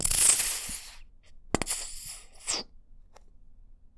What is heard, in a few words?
Plop Viscous, Bottle Plops